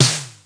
PERC

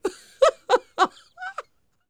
LAUGH

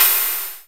SYN CYM; DRM FX; E-Lab; X Static Goldmine 2; akai

